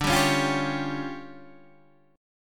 D Minor Major 7th Double Flat 5th D Guitar DADGAD

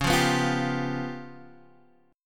Db 7th Sharp 11th; strummed; JGuitar

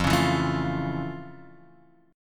F Augmented Major 7th F; F+M7 Chord {1 4 2 2 X 1} strummed

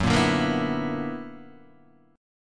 B-Minor Major 11th

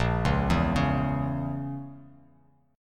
A sharp Diminished Bass Standard, chord; arpeggiated

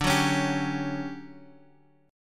D Major 11th D, Guitar, Standard; strummed; JGuitar

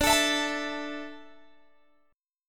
D Suspended 2nd D, down, Guitar Open G

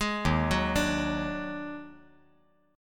Eb Minor Major 7th Double Flat 5th; JGuitar

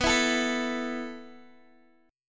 B 7th Suspended 4th B down Guitar Open G

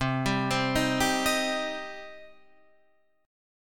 D sharp 6th, C

